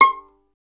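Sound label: STR violin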